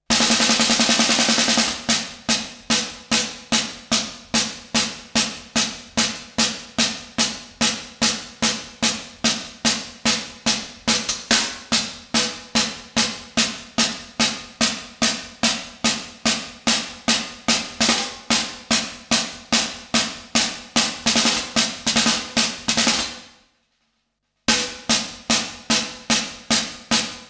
drums

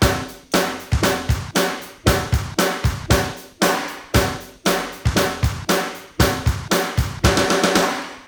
Chorus Drum Guide; Multitrack; Remix Parts

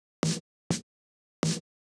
Snare

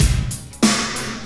Snare Loop

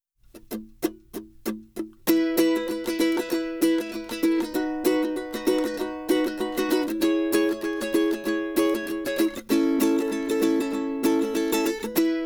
Acoustic Gtr

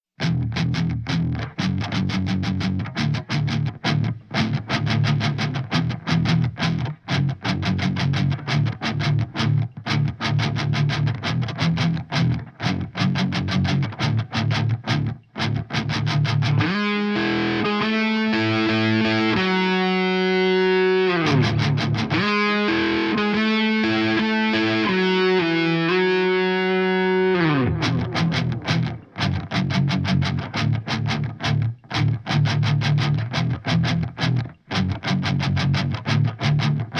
guitar

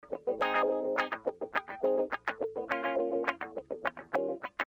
Guitar

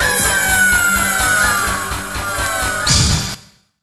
scream

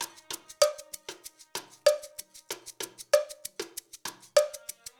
Shaker Intro Loop

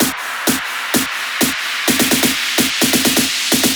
128BPM Fills (2 bar), EDM; Shockwave; ESSENTIAL EDM FILLS VOL. 1

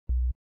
Bass; Remix Sample Pack

